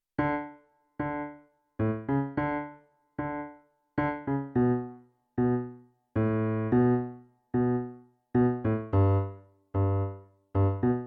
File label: beginner 110 BPM, violin